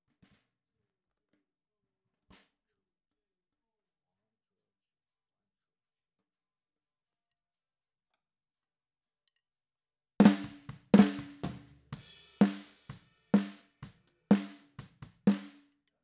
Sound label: SNR TOP